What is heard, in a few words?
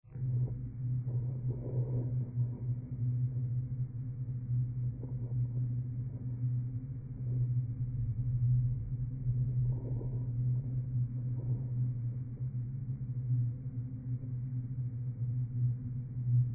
Rainbow bridge vibrations